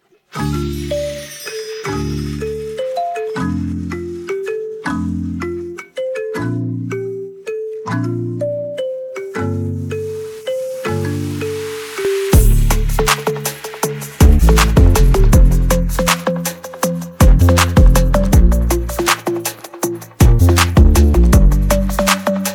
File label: instrumental